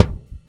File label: Swinging, Dimensional RL Kicks; Airwindows